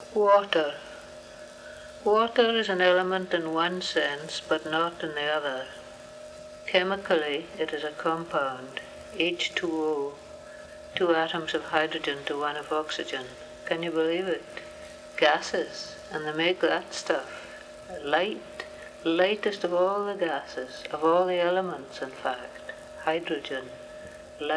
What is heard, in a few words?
Water